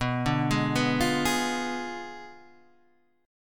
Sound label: B Diminished 7th B down Guitar Standard; arpeggiated; JGuitar